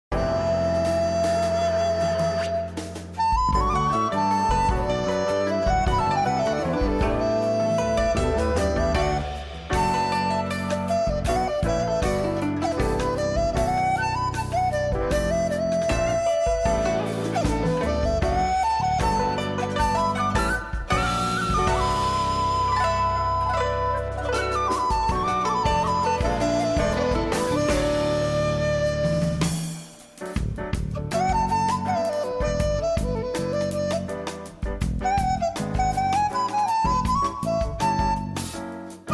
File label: jazz, Chinese Style Jazz; suno